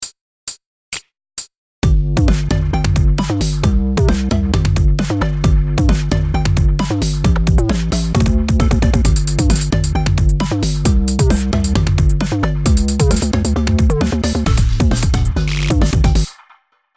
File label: K-pop